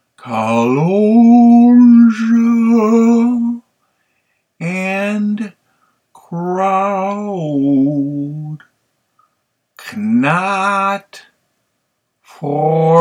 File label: musical score accapella version